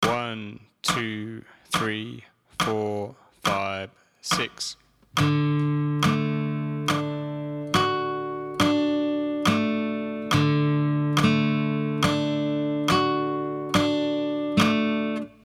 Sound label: everyone feels, guitar arpeggios